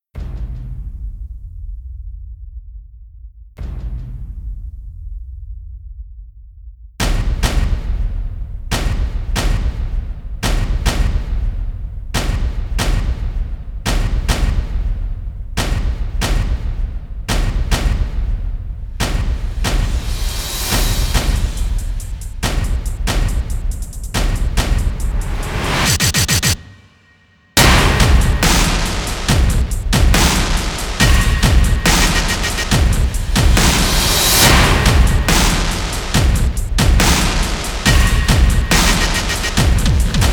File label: behemoth percussion only